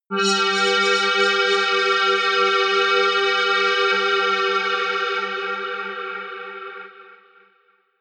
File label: atmospheric waves high, drones